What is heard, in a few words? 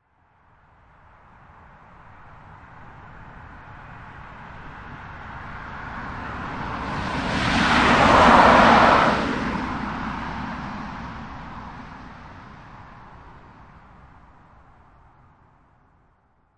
Transportation Automobiles, Car By And Away, Dry Road, 45mph; Car Sound Effects